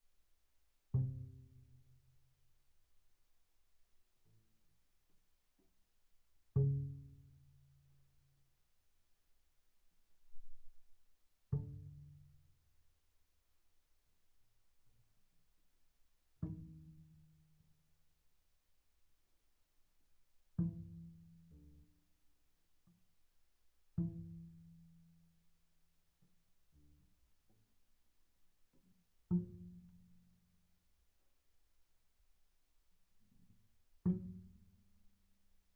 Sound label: Bass pizz sul A pp C3 A3, double bass